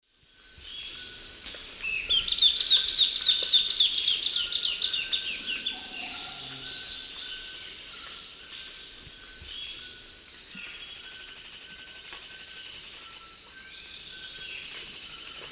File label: Hylophylax naevius, varzea, song; S. America; Eastern Lowlands